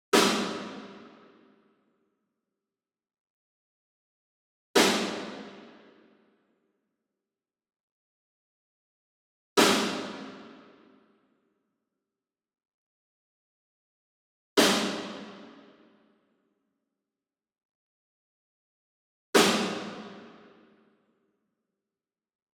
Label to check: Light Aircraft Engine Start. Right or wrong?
Wrong